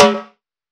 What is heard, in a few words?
Moombah Tom; Noises; Synthetic's Serum Collection